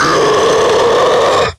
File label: rage; kfsounds; css